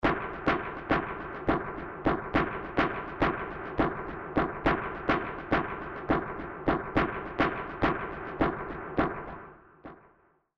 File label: Session Noise Baton Groove Right